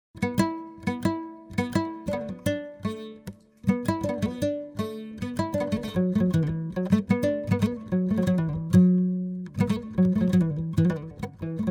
Desert Blues

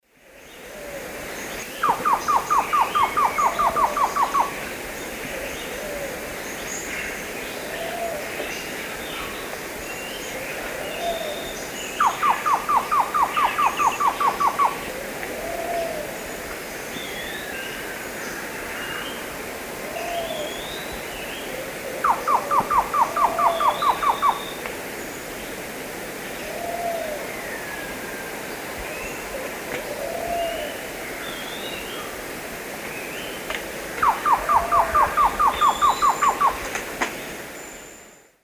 Thamnophilus praecox, song female; S. America; Eastern Lowlands

